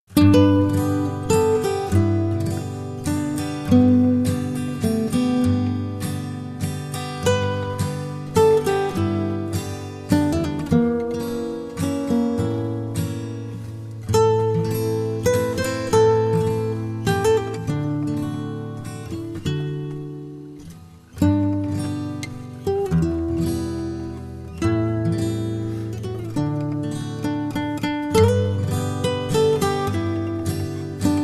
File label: Walking Lullaby